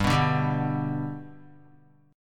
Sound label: G Major G down Guitar 4F 4A 5C 5G 5C 6E; G strummed; JGuitar